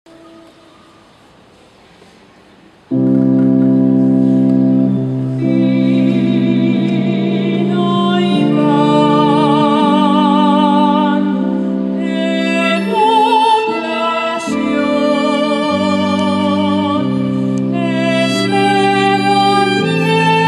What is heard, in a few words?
Soprano; ofertorio